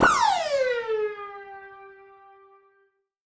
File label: Siren Horn down, Ramp Down, G; future rave